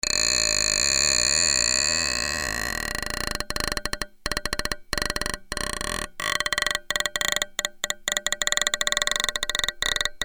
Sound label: Metal-Plate; String Plate